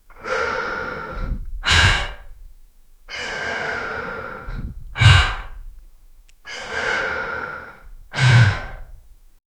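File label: old but mighty man exhaling; work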